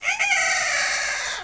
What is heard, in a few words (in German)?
hahn; Jump Zampoli